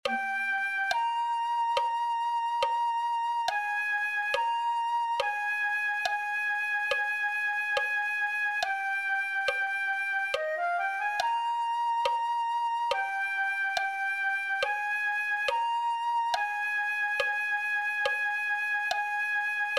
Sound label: flute